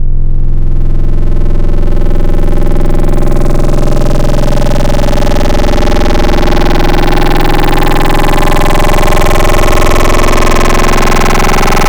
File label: Flushed, Wavetables; Cymatics; Essentials Wavetables; Serum